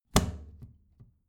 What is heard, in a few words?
plastic trash can close heavy muted